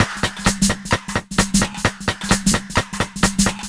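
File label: Percussion, Drum Loops 130bpm; Zero-G; Soundclash Nu Dance Electro; Acidized Wavs; Klub 2 Death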